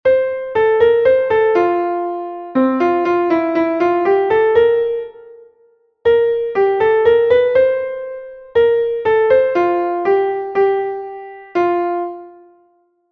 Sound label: Symphony No. 8, Classical Piano